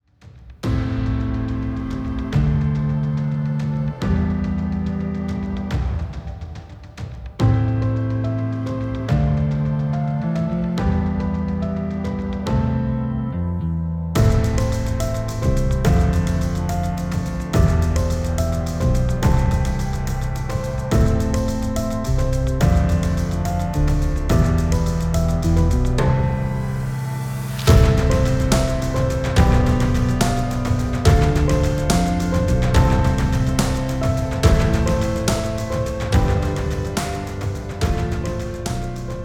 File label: Action